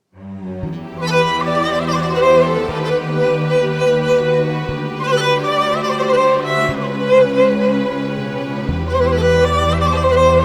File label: Theme one violin